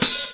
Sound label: clean open hat g sharp key, Loudest frequency: 7413Hz; open hat samples